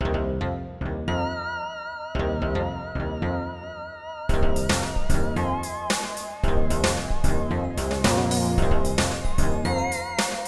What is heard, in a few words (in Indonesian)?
Nada Dering Nokia Jadul; Urbania